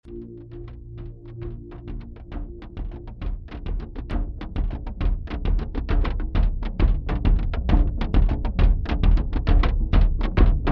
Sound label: foot jigs; corpse